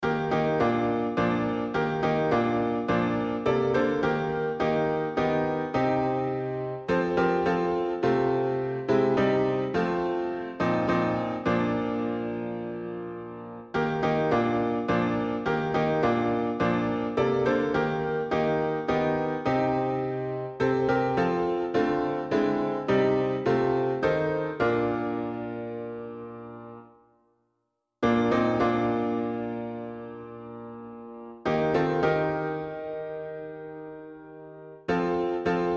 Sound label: MIDI